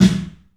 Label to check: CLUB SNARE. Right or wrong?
Right